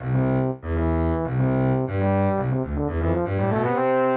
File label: basson